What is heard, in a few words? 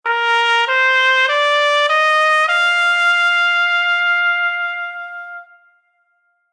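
Horn Legato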